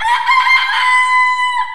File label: Rooster; GlobalGameJame